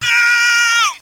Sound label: scream